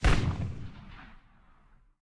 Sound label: aa fire small, guns